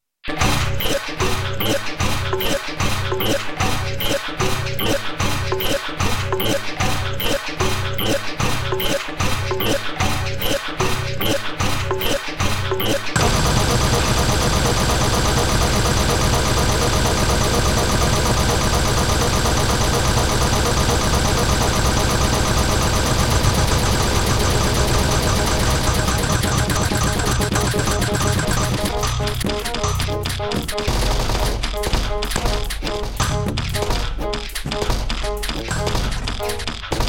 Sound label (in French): Pulsation